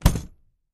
Closed, trunks

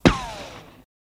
Machine Gun Silenced, silenced bursts

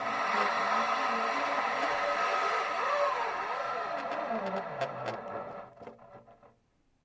Metal Cord Movement; cables metal; Interior Movement Of Cord Pulled Against Metal Like An Inhale

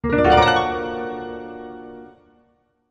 Music Effect Synthe, guitar

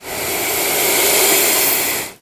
Lizard Water Monitor

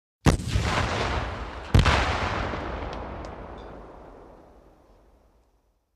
Shldr Mount Missile R, missile exterior

